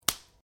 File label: Branches Dead Snap